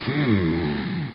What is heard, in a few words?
humm; BattleSNDS